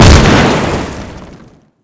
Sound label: tank shell; wac